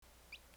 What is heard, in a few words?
Peep Amplified, rat